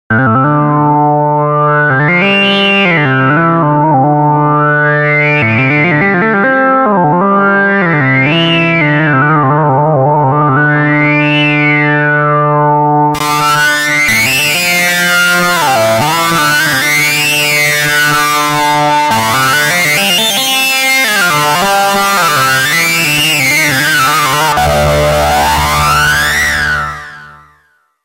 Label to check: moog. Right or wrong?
Right